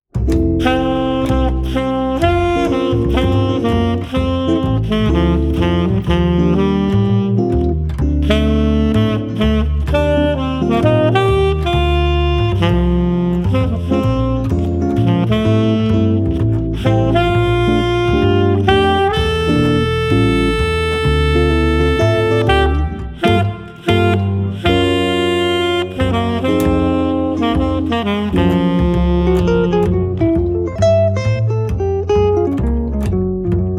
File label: Jazz instrumental trio